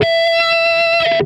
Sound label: dist wah E5, Guitar Special, distorted wah, notes